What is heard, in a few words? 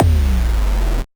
Noise; musicradar; 8-bit bonanza